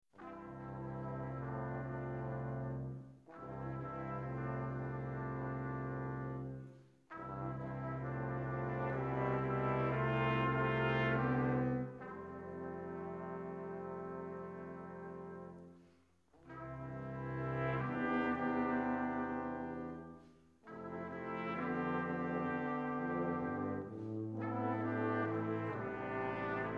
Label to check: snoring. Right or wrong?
Wrong